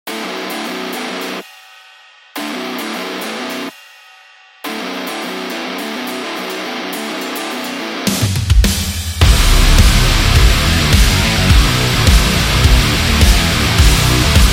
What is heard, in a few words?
Metaling